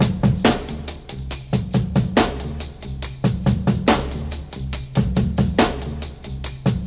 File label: 140 bpm breakbeat sample b key; drum loops